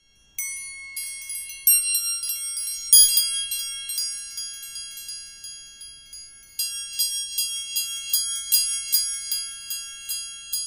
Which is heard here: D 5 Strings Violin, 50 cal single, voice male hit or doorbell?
doorbell